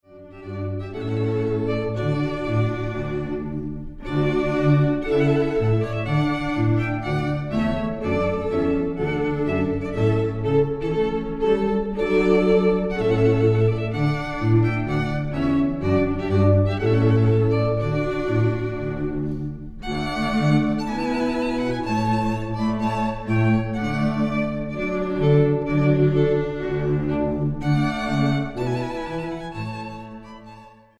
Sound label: Trumpet Voluntary